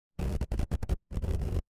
transition glitch

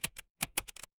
office keyboard

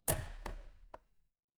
office stapler

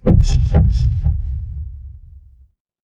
magic scifi shield

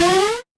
retro game power up

